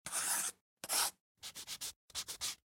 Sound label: slice n dice